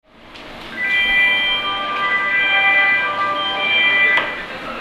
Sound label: otsuka melody, Tokyo Train Tunes; japan